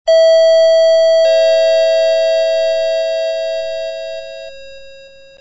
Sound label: gong